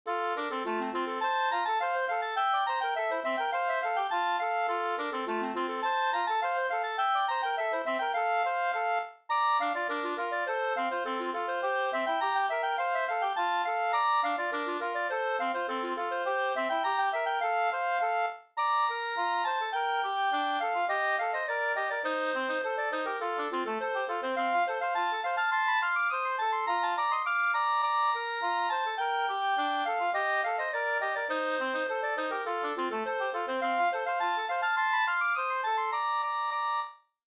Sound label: polka